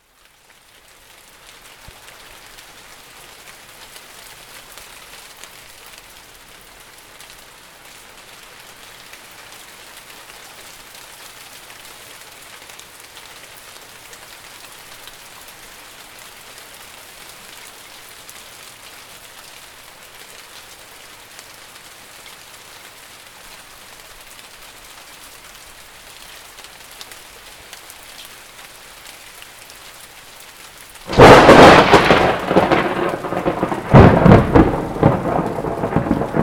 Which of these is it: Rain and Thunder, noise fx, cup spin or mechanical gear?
Rain and Thunder